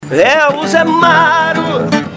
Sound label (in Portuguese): Vinheta Cantada; ZMARO